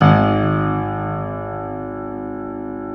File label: G 0 R+R PNO; Keith Emerson Signature Series